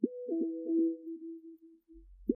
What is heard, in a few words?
notification